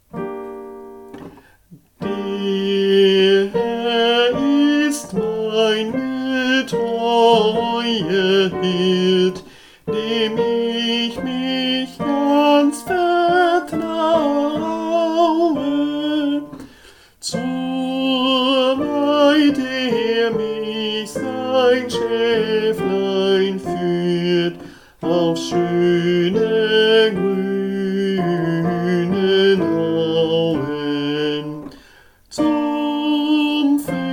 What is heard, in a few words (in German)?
Sopran